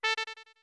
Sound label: Notes Pipes A short